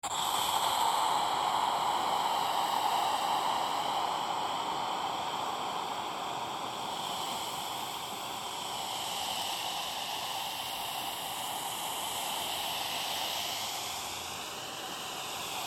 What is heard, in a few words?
Steam Release Iron Breath Slow Modulations; Miscellaneous Household Sound Effects; Big Room Sound